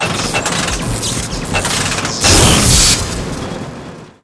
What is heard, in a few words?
evil machine attack